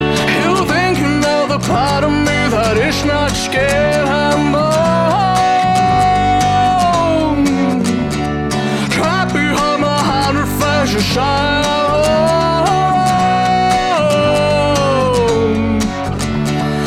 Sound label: Ringtone